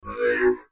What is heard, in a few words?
robot mech vox; creatures mechanical